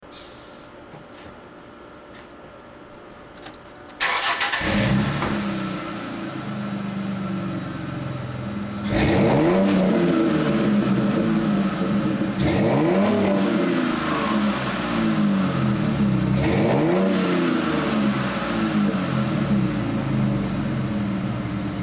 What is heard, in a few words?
reving